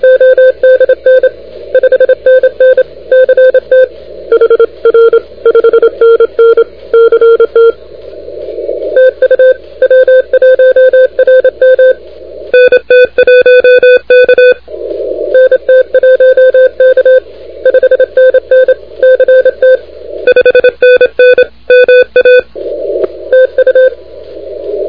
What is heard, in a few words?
CW 28MHz; ARRL10M